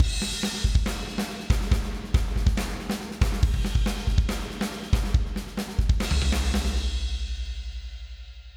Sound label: STUDIO DRUMMER; KONTAKT LIBRARY